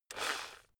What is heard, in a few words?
rock drill rev low short; maschinen; bohrer